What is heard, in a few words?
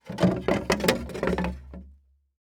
Foley Footstep Golf Cleats Stumble On Wood Board Walk; Foley Footsteps